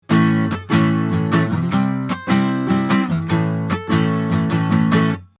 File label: Shure SM7B A Guitar 30cm Strumming